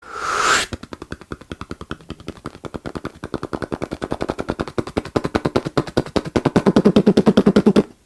Helicopter

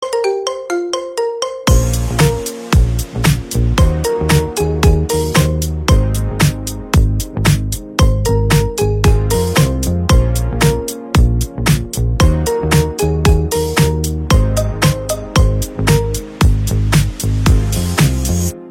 Marimba Remix

